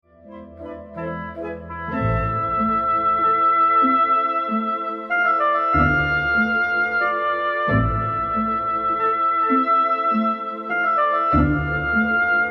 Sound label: C Major Symphony